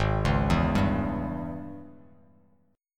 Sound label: Ab Major 7th Suspended 4th Sharp 5th Ab down Bass Standard; arpeggiated; JGuitar